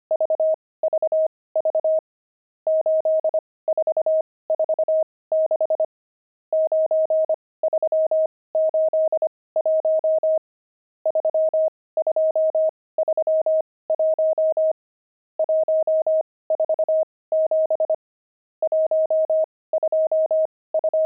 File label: pangram, intermediate pangrams files